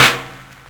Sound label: INS TIMBAL